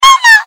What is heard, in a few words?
tether pull; billy ed edd n eddy sfx